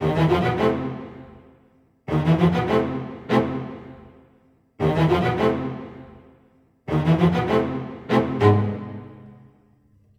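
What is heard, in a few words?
90 A minor, Low Strings, Orchestra; 90 s Sample CDs; PowerFX - Downtown Orchestra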